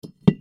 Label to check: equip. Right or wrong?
Right